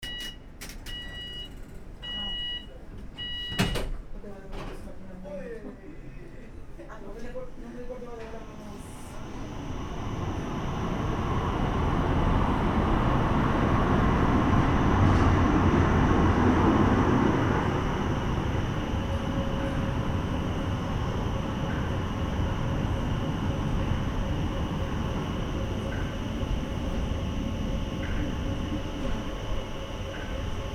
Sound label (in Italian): Funicolare Lugano Stazione; FFS